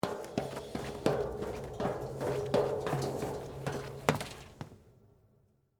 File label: Spiral Stairs Down, Footsteps, Metal, Spiral, Staircase, Descend, Fast, Lighthouse; Footstep Sound Effects; BlastWave